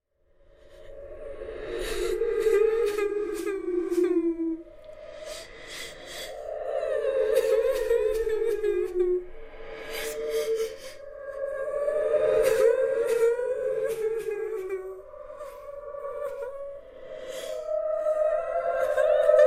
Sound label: creepy ghost crying and weeping horror sound effect